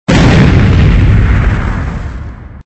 explode sound

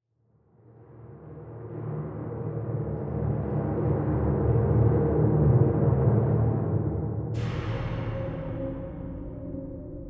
Spooky Ship Mood

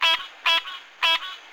Hub Swamp Frog Bark; Levels Hub Swamp

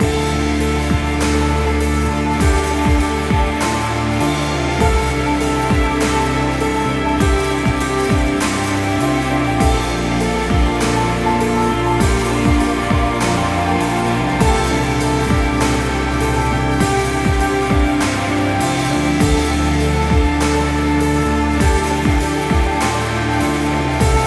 Ending Screens (Loop); Contraband Police

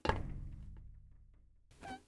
glass land

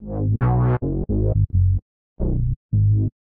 loop bass

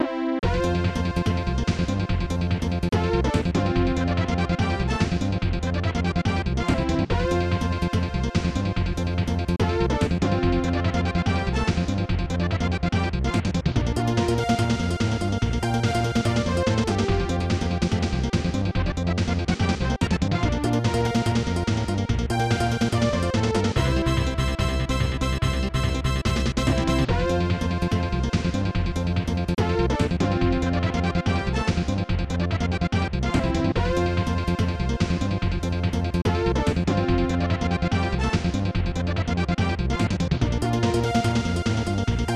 Racing Menu; Super Keiba 2; SNES

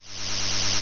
incoming; Dino Run

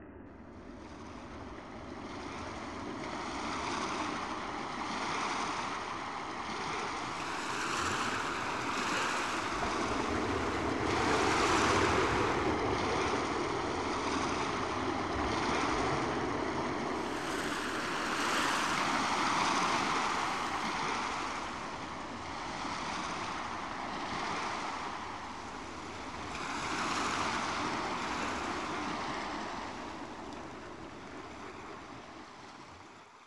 Water Ambience; project exile prototype; gba

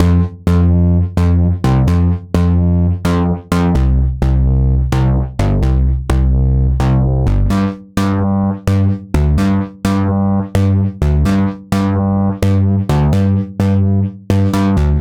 Poly Bass; super crush k.o.

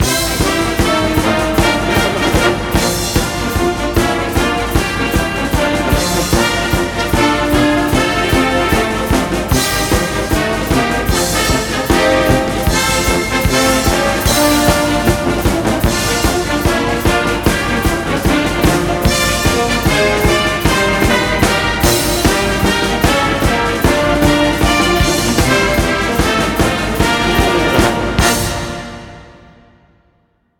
Broadcast Fight Song

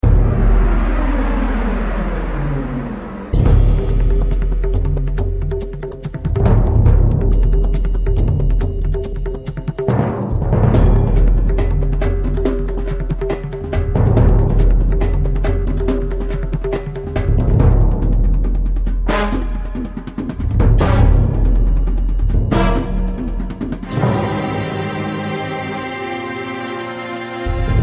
combat music stage 2; Might and Mayhem

